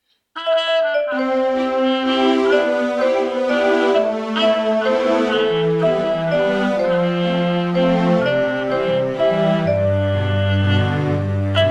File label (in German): walzer